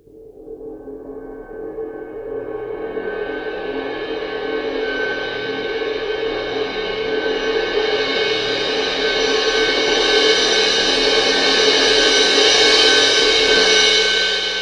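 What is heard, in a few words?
c suspended cymbal long roll to crash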